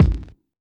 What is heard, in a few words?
90s hiphop kick; Reference beat bpm90-A